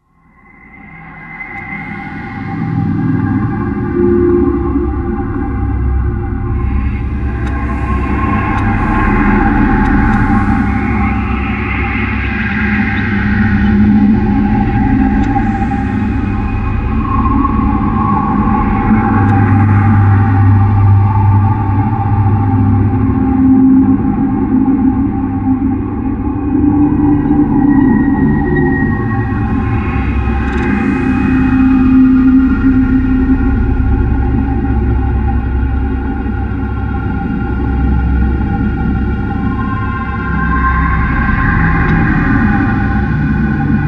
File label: Power Core Ambient; Hydrophobia